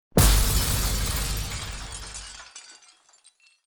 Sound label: glass floor break; mage lord; Hollow Knight